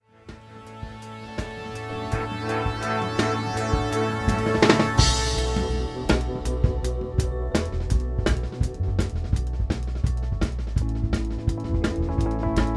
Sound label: keys solo middle 8; wormhole